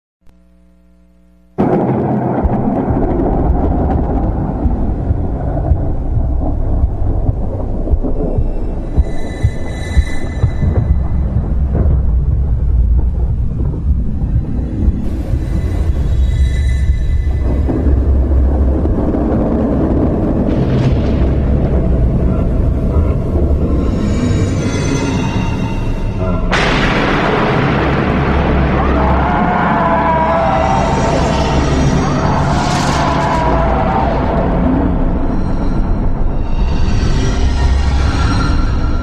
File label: sonidos de terror en bosque forest terror sounds